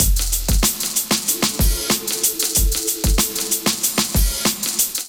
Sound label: Wah Soul Kit, Drums; Ableton; Factory Packs; Chop and Swing